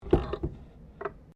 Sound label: GUILATENE PAPER BLADE RAISE, Office manually operated guilatene